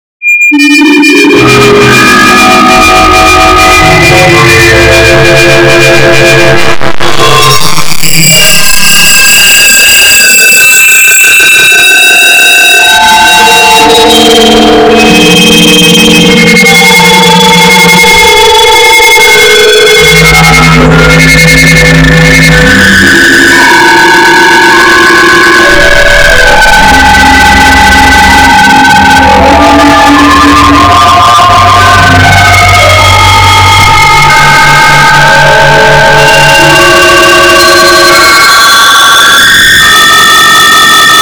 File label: noise